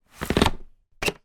transportation child car seat back height adjuster up